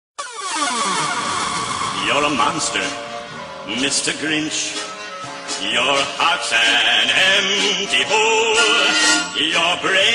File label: dubstep; grinch